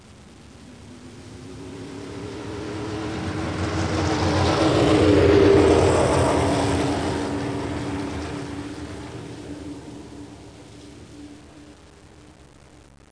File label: indy, sports, raceing